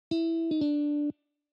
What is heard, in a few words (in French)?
effets de jeu Slide